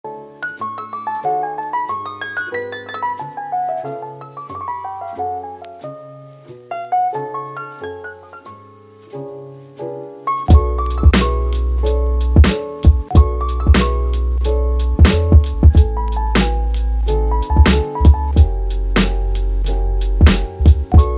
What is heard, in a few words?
EARLY MORNING CHILL, Reminiscent of 90's Jazz / Hip-Hop records; instrumental